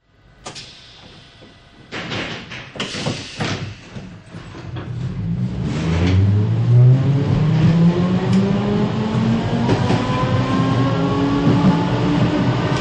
Train Start-Stop (Interior) Interior French Tram Starts; Hollywood Edge 3-D SFx